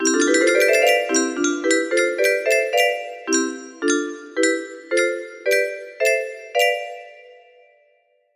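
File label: piano test, music box melody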